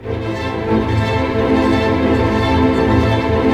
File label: STR ARP MI, Symphony Orchestra, STR Arpeggio; Roland LCDP08 Symphony Orchestra; STR Arpeggios